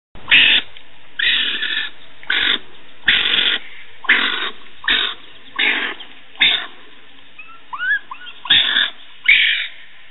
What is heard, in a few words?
Baby Raccoon Sounds